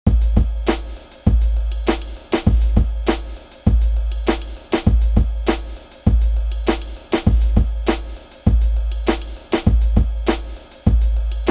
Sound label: Beat